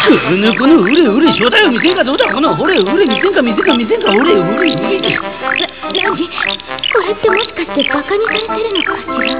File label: tickle